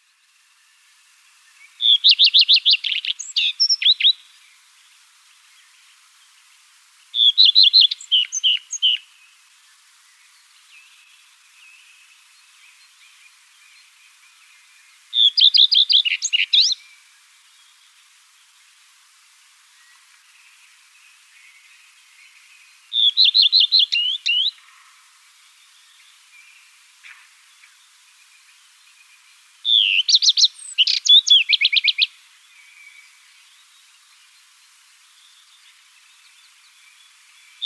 Gartenrotschwanz Gesang; uni-kl

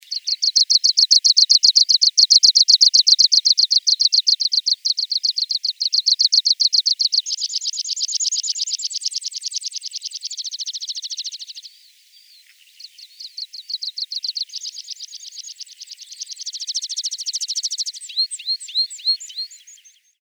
Wiesenpieper Fluggesang; uni-kl

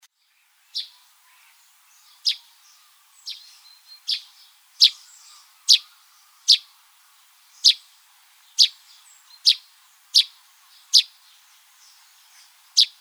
Feldsperling Gesang; uni-kl

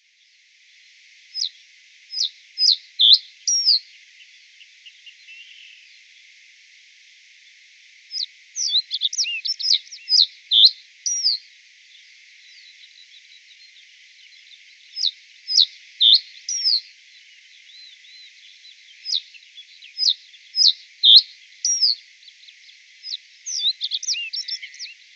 Rohrammer Gesang; uni-kl

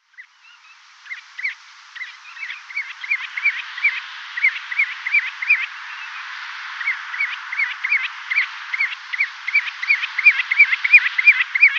Sandregenpfeifer Fluggesang; uni-kl

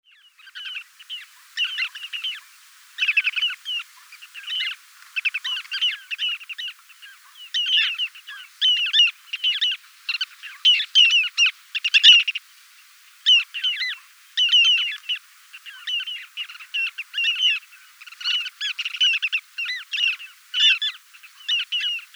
Steinwaelzer Rufe; uni-kl

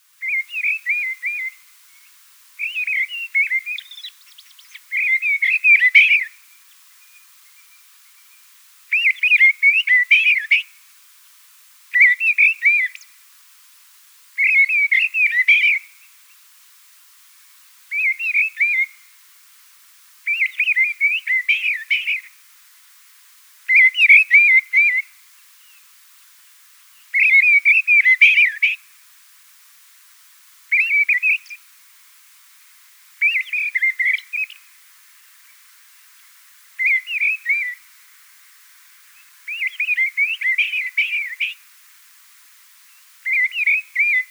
Misteldrossel Gesang; uni-kl

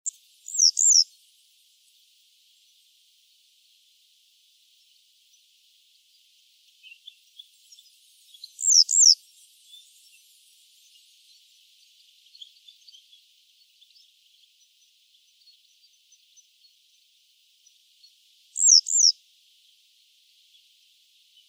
Wiesenschafstelze Gesang; uni-kl

